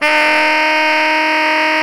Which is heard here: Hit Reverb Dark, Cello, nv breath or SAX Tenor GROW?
SAX Tenor GROW